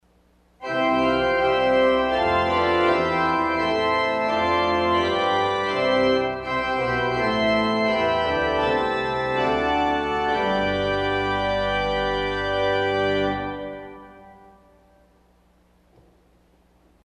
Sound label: St Anne Principal Chorus, organ; CulverAcademy